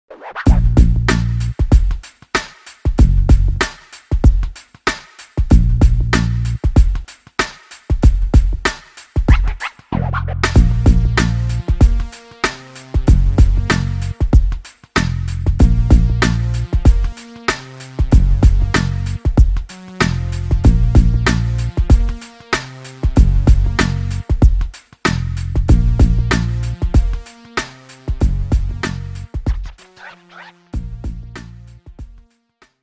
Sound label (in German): beats flows